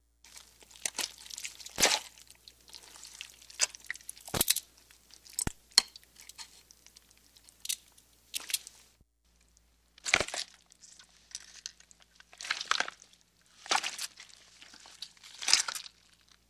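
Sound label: Knife Slash Deep Wood; knives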